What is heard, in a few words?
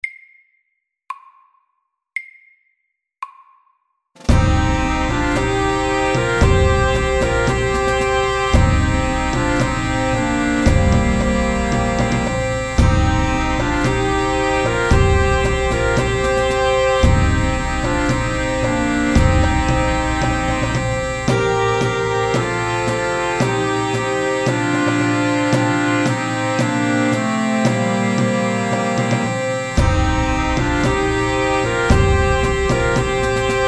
Pipes and drums MEDIUM 113